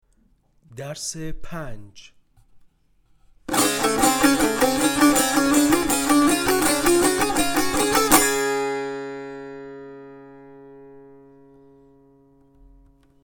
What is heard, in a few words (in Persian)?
مشق تنبور